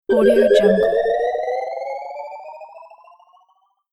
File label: rising magic